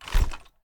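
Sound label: drop quick movement; gmod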